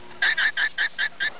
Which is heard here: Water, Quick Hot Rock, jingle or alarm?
alarm